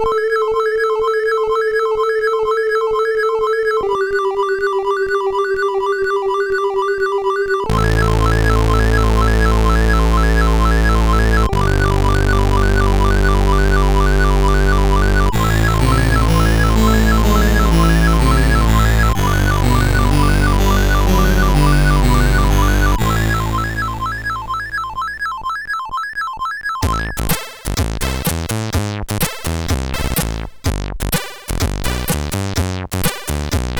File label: CSG8580R5; sid